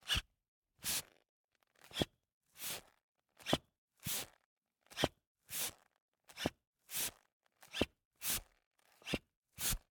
Handbellows, leather, wood, brass, pumping, wheezing, blowing, supple, x slow; Eiravaein Works; Vaeyan IV